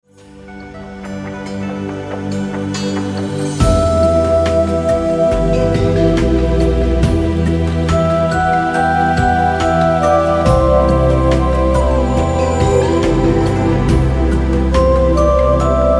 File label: Calm Stones